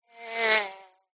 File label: cartoon fly bee wasp pass by